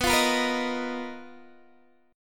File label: B Major 7th Flat 5th B down Guitar DADGAD